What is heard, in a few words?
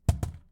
Heavy cam drop; Phasmophobia